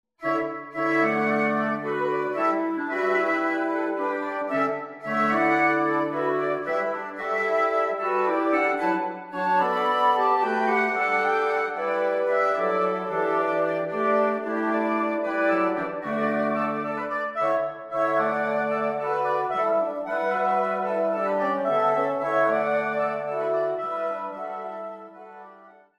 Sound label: Excerpt Andante grazioso, Wind Quartet